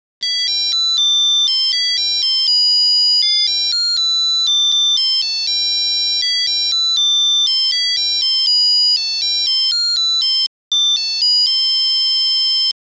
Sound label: Rock a by baby, Melody song